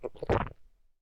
gokuri; anemoi

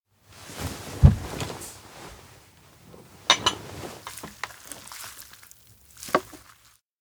serve rice; rikka; anemoi

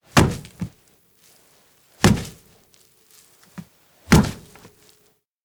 dough slap desk; anemoi

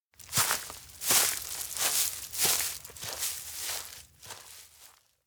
steps outdoor leave lake; anemoi

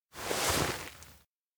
kiss approach; spica; anemoi パッケージ版 1.1

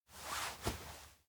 arm support knee back; awayuki

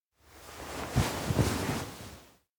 try situp; rikka; anemoi

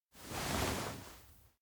futon nod; rikka; anemoi パッケージ版 1.1

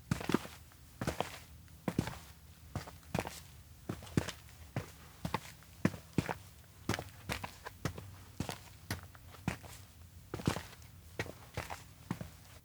steps outdoor observatory walk; mugi tsuzura; anemoi

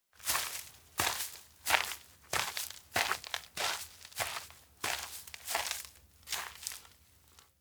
steps outdoor field walk circle; kurumi; anemoi

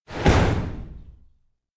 shutter open; anemoi

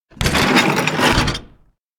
truckbed engine push; anemoi

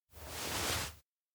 point; awayuki; anemoi

